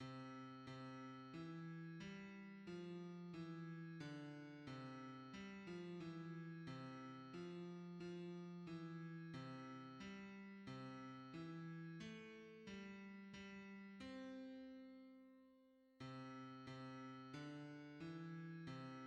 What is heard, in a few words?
hymns, bass; To wait for that appointed day